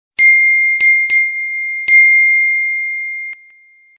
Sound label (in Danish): iphone notifikationer